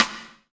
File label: Rock Stick, Roland BK-5 Rimshots